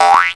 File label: SPRING, CARTOON